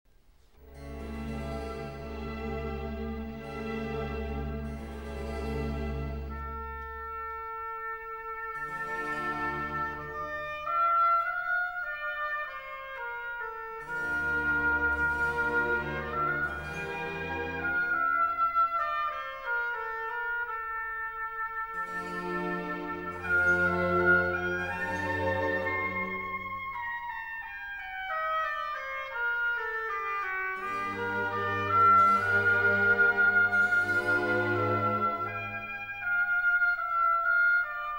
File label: Suite in F Major, Adagio; Riccardo Muti; Handel; Water Music, Suite No. 1; HWV 348